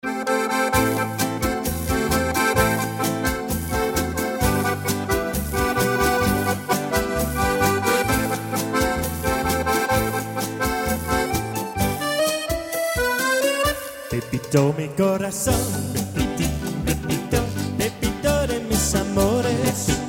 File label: cha-cha